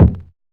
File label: kick trash